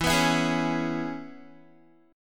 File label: E 6th, down, Guitar; strummed